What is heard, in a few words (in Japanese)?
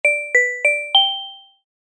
場面切り替え ベル 遅め